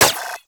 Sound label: Braton Prime Redux Prime Layer, weapons; Warframe